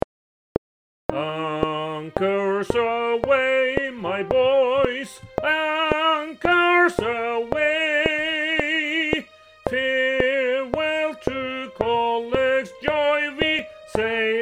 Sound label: Tenor 1 click